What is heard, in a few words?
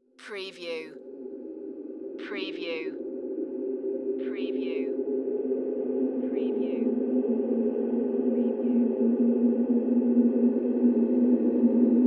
Drone Airy Ascend; Sound Effects Bible